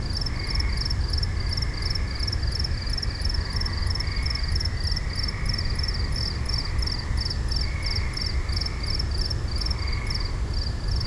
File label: Suburban night crickets frogs; urban and suburban; boom library